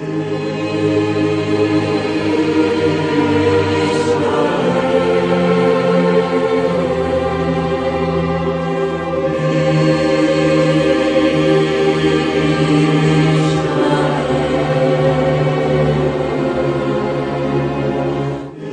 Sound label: voice choir; Sad; Loops